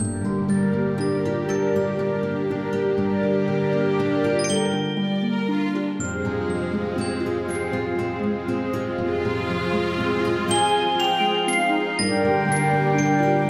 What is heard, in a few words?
music world spooky forest